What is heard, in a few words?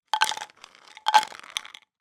medical vitamin tablets x2 in plastic pot movements